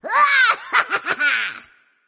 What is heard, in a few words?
nv battlecry; smite; voicepack; ratatoskr